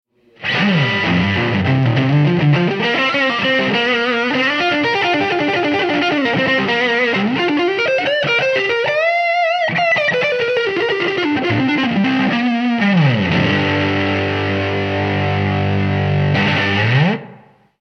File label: tele neck; LEADER